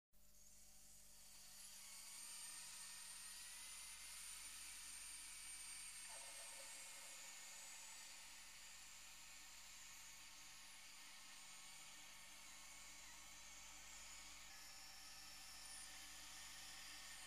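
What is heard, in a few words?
hissing steam leak explosion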